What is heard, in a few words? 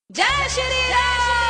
hindi devotional song; siya ram jai ram jai jai ram